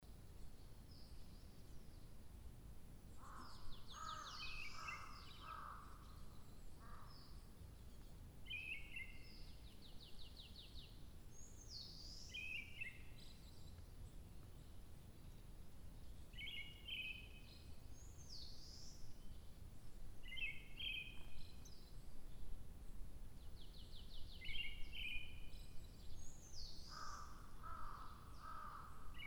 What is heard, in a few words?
spring forest